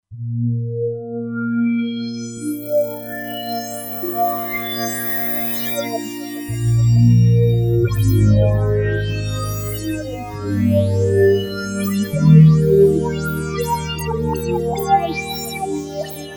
Fx Harmonics, synth

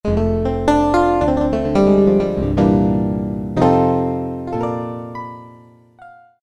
Synthetic Piano, Roland PN-D50-02

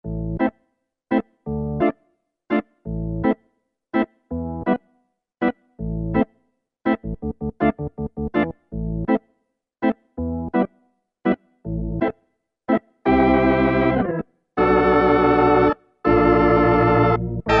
Organ, Kurzweil K2000; KEYBOARD; Kurzweil K2000 Contemporary ROM; Contemporary ROM Programs and Demos diskette

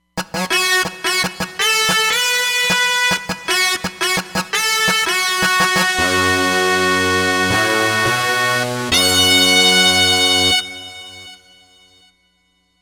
Hard Sync, Roland Fantom XR

